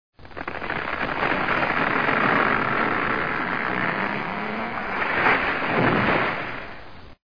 Baum Faellen; Haushalt

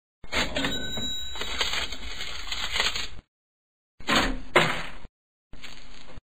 Kasse Kleingeld; Haushalt

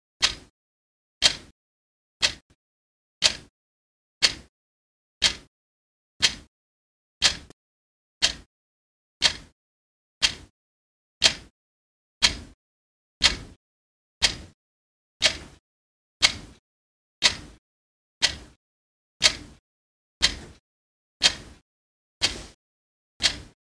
Uhr; Haushalt

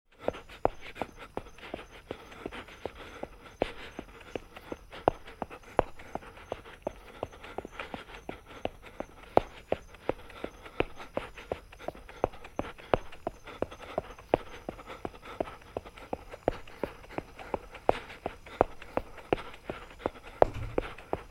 Joggen; Sport

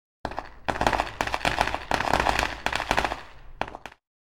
Silvesterraketen; Haushalt

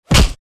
Schlag; Menschen

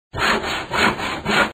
Saege; Haushalt

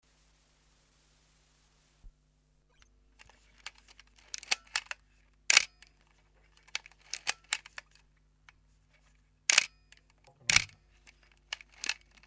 Fotoapparat; Haushalt

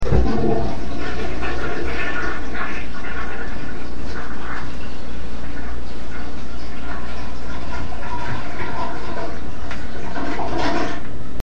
Toilettenspuelung; Haushalt

